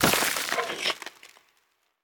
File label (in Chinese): 流浪者转化声